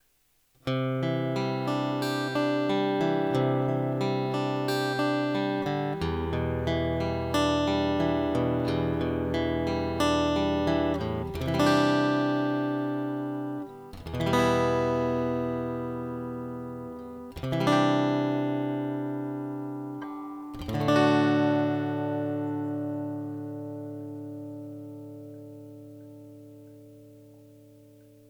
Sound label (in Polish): gitara akustyczna taylor; test kabli